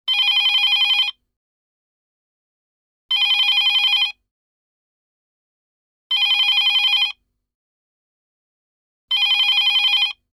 phone

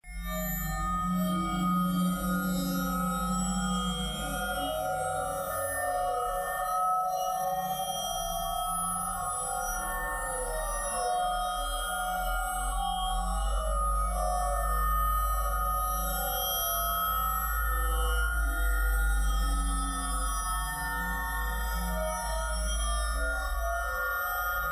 bell

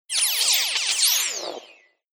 laser

